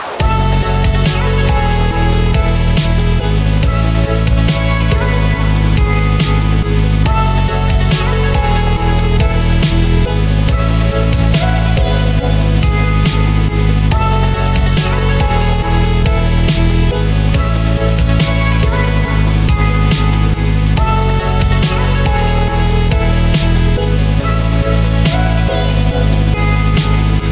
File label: Melodic Dub Beat